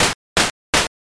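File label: footsteps water walk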